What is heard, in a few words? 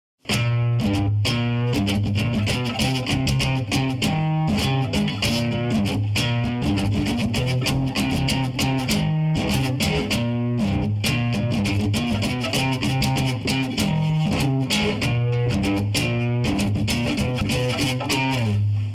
Riff